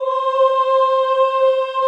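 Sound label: choir C4, Ooh; Ooh + Ooh 2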